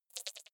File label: click erratic wide buzz, signale